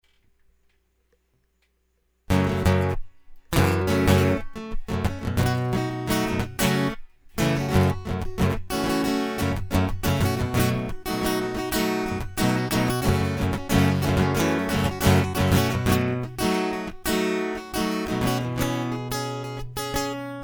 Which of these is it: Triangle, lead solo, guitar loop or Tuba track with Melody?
guitar loop